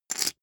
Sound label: poker chip stack rattle